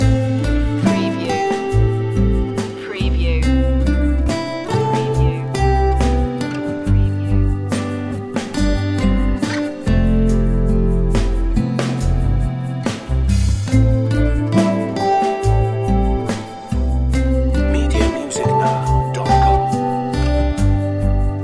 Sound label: mellow guitars